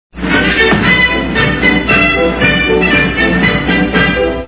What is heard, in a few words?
door music; wizard of oz